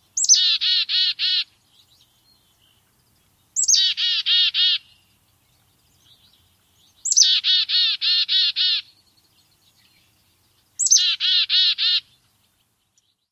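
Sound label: Black-capped Chickadee chickadee-dee calls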